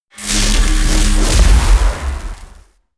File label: disintegrate